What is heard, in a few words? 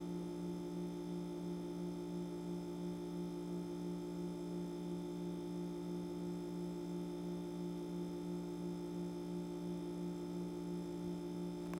Large Refrigerator Compressor Hum